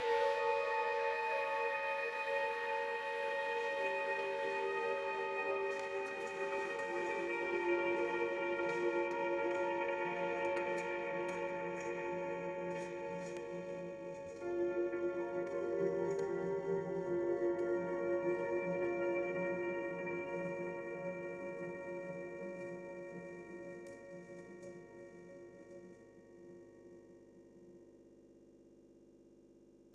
ambient meditation

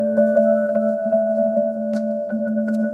notification; ringtone

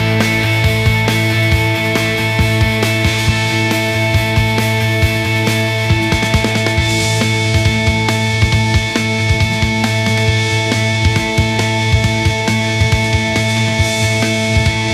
ringtone rock

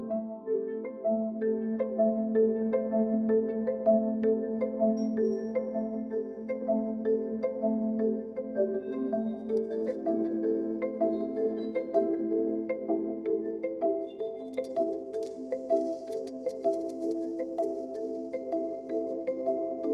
alarm

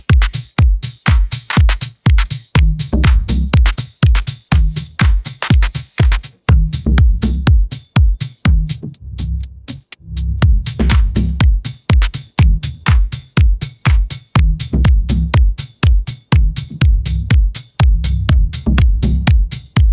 ringtone edm

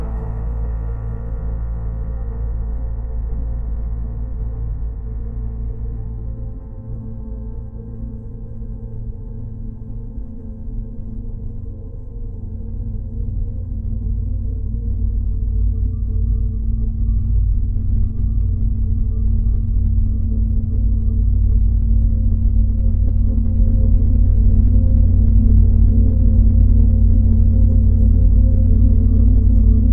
ambient space

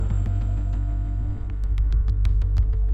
funny

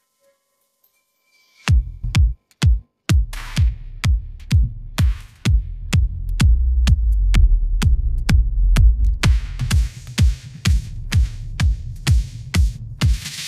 ringtone edm

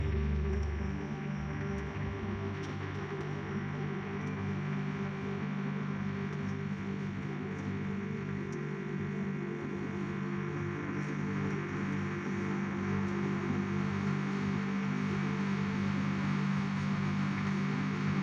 ambient nature

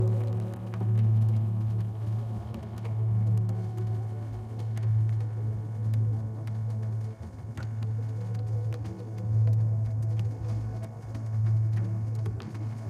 ambient nature

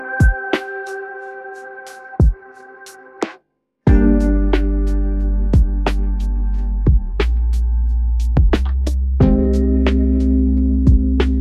ringtone lofi

